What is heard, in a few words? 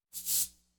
Cabassas Accent Rhythm